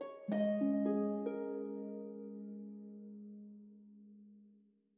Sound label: Calm Harp; super mario maker 2; Peaceful SFX Raws - Otoasobi